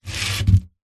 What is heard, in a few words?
foley wooden box large slide gritty concrete